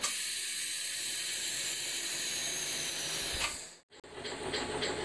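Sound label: compressor start, Train; OpenBVE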